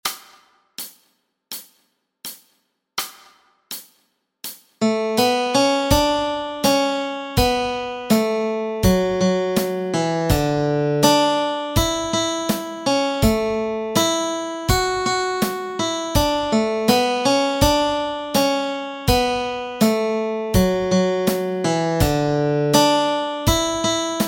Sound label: melody